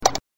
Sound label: button click sound